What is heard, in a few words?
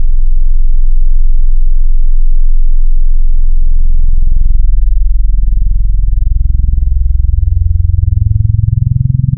Harmonic Subtle; Digital; Synthetic's Serum Collection